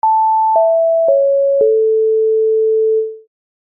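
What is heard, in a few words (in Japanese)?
お知らせ・終わり・遅め; 開演ブザー; 演劇・舞台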